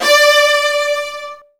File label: Virtuoso Strings